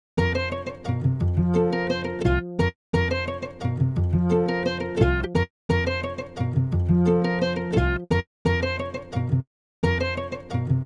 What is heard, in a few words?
Strings